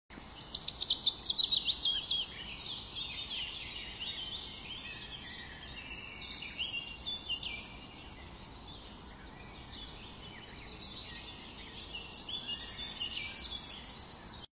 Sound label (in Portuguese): Canto do Carpodaco de olhos rosados